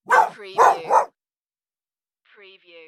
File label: Dog bark woof, woof, woof; beanstalk audio